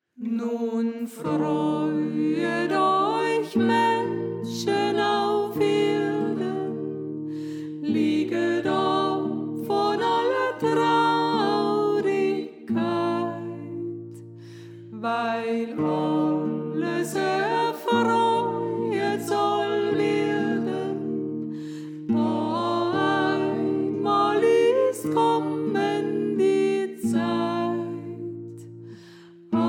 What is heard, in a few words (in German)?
Sopran; Nun Freuet Euch Menschen Auf Erden